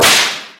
auto attack

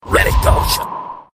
shoot

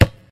basketball

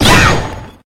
hit bat

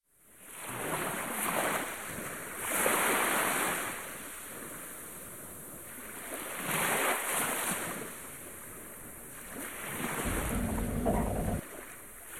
Fight background

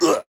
Scream

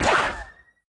flyball

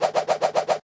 skill roll

